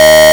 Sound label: Synths D#; Vengeance Samples